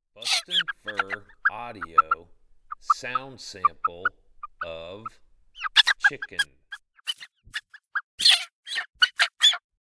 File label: Chicken